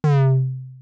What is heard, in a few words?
Retro Laser